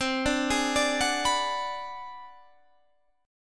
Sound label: G sharp 7th Sharp 9th Flat 5th